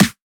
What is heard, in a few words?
Snare, Dance Drum Kit; 99Sounds x Monosounds; Twilight